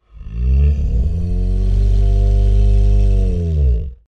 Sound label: Creature Large Moan Rumble w Breath; Monsters; Horror; Big Room Sound